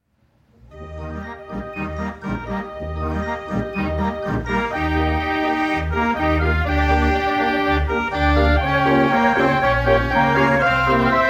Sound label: 87 key gavioli fairground organ; the jesset collection